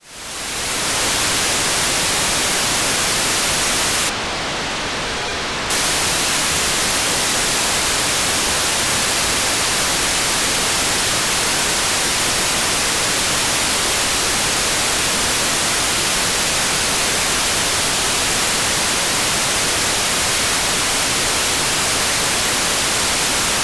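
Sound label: NSIGHT1, satellite; AIRSPY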